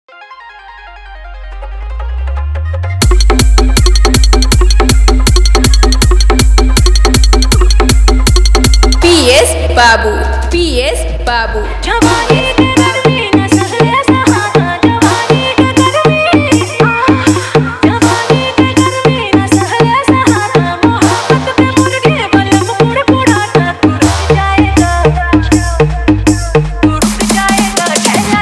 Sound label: Fully EDM Bass Mix